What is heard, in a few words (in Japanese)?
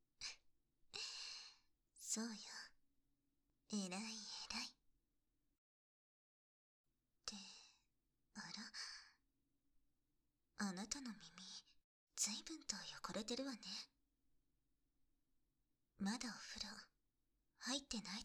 右耳かき; ASMR